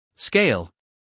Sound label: scale